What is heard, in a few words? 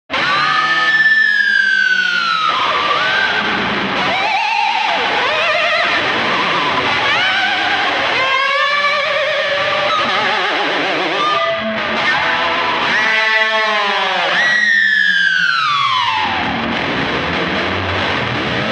Weird distorted electric guitar; Music effect; Hollywood Edge Sound Designers Tool Kit